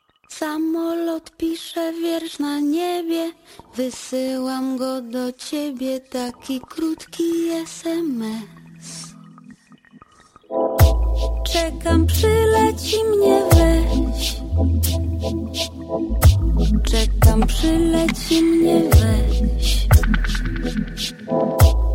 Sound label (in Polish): dzwonki SMS